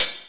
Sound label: Open Hhat, Hihat; Equipped Music; Loungin House